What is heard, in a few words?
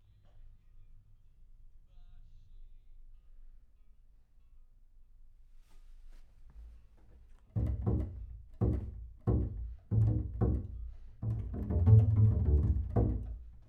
Bass Room